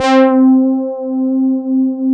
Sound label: DS8 C 4, Korg; Back In Time Records; Korg Universe VOL-1